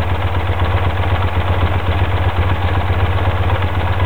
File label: good idle, Carburetor; Tune Up; Carburetor Air Cleaner; FCR; setting FCR